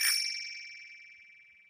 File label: item acquired